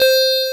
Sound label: JV-1080